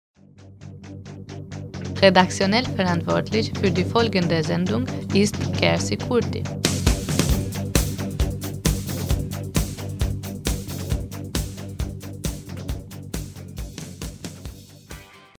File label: Opener